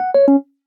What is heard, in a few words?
Low Battery; Winmax; Dolphin-V2